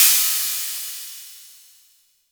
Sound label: Cymbal